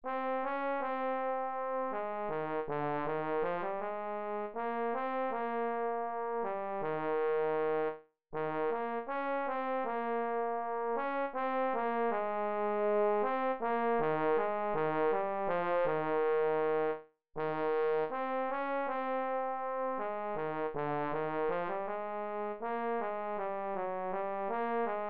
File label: trombone